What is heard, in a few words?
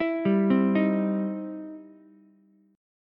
E Minor Major 7th Sharp 5th, E, down, Ukulele; EmM7#5 arpeggiated